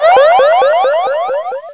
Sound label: life lose; chromium-bsu